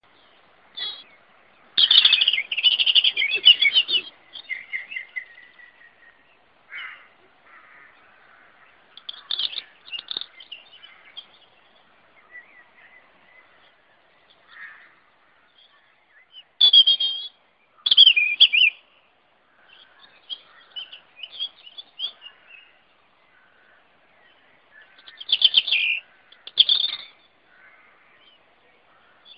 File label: evening birdsong at local nature reserve; wapley bushes